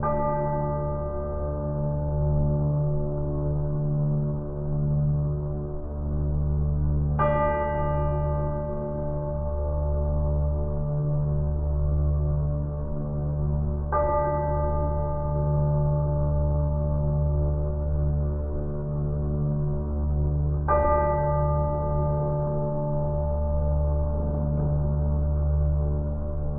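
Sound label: strings and bell only one note four times, sad; loops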